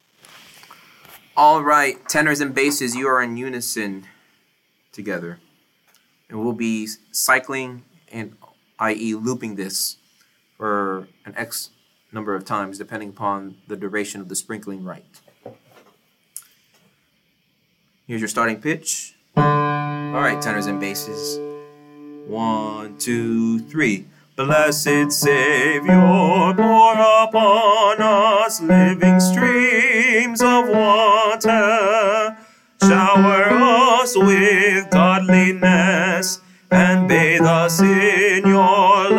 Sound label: Tenor Bass; Chamber Choir